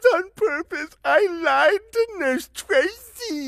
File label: peeing